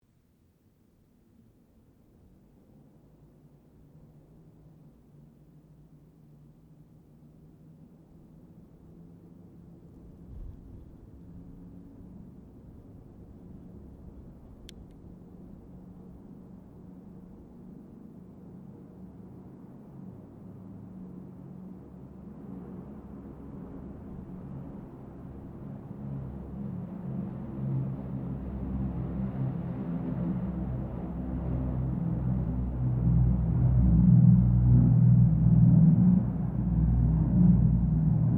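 AIRCRAFT LOCKHEED L-1049 SUPER CONSTELLATION TAKEOFF DISTANT; 1955; Connie, Super Constellation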